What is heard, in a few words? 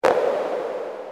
drm1 Claps